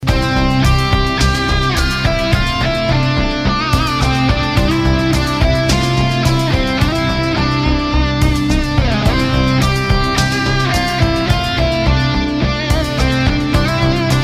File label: anthem ringtone, acoustic guitar